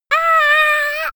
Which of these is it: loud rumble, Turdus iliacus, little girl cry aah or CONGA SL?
little girl cry aah